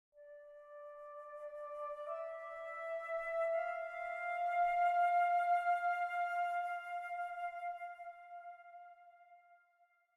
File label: Flute 58bpm 4-4